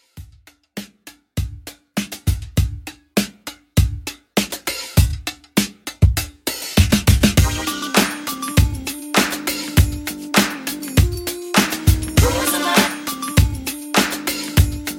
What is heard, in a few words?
100 Bpm; 90s Redrum